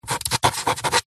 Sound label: office quill pen writing siganture